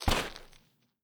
Footstep Sand; Opsive; UltimateCharacterController

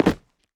Land Tile; Opsive; UltimateCharacterController

